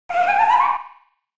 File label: Monster SFX